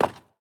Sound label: reelBounce; templeofnudges